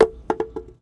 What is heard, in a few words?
cardboard cup impact hard; physics